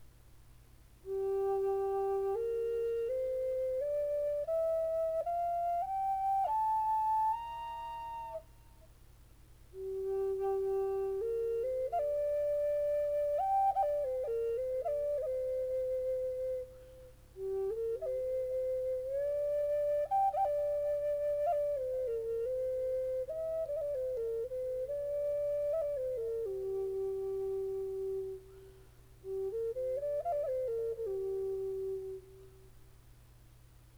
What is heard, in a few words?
cedar g, flutes